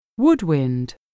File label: woodwind